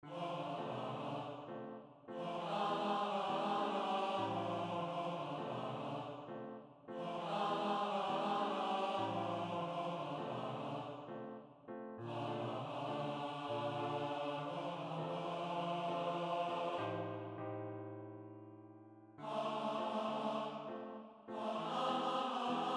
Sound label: Agua TENOR